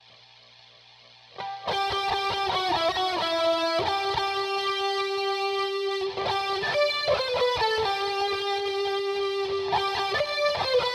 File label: Guitor